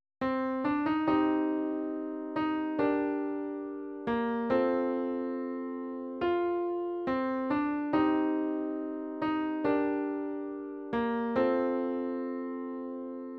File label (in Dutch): Voorspel Toon mijn liefde